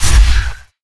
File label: teleport; ML-Agents; FPS Game